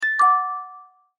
music box, notifications; MyPhone Agua Rio

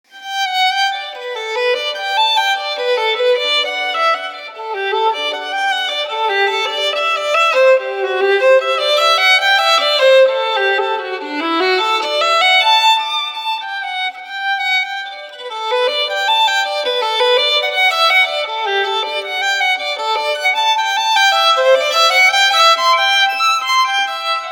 Ring Arioso; Vertu Constellation Quest

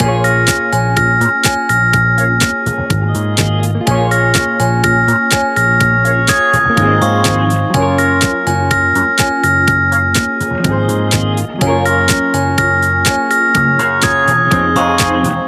Funky Ring Mono, ringtones; Sagem

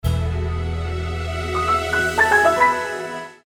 Power On; Ucell

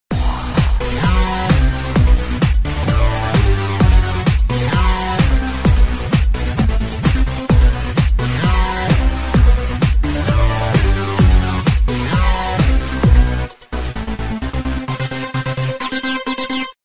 Techno Beat; LG; CU500

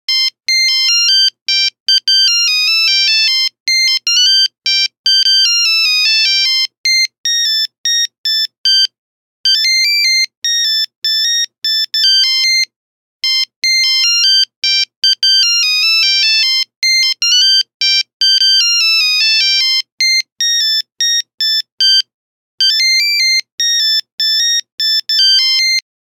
Sunny walks, Nokia Buzzer; Windows Phone apps

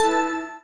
default beep; Nokia; 770 Internet Tablet

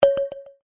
Simple Low, Notification; HTC Desire

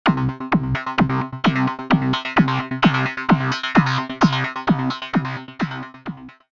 heat synth; HTC One-X; ringtones

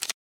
Unlock

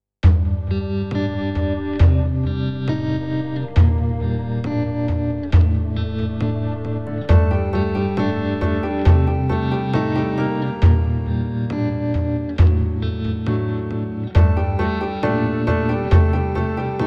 Girl with drum; Sony Ericsson; PlusFourSix

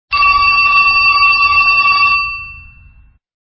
Antique Phone

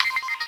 shutter; KU990i Viewty

